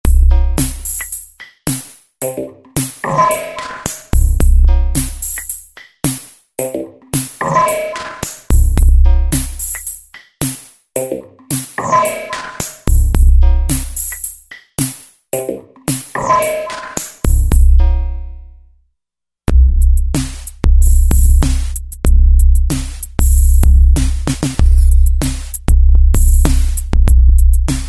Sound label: Modular KIT, Roland V-Synth; Factory Patches